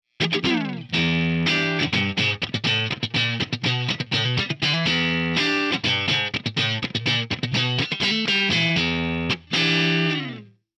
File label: Metropoulos GPM 45